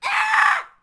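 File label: Settler Child Die Vox; Civ4 warlords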